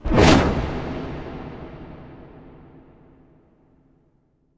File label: Impact Wipe, Cinematic